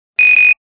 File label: Buzzer, Nokia 5500 Sport